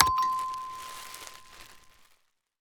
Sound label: pop Play Button; game audio